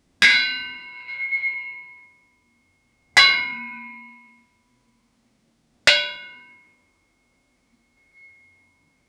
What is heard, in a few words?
dry resonant metallic, chain